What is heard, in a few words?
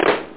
claps; da capo vol1; drums